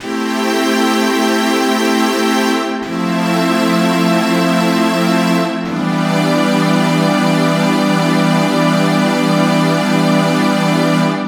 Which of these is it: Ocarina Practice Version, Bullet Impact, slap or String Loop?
String Loop